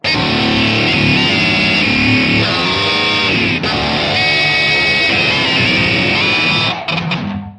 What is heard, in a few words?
xtreem low noise, Guitar Noizes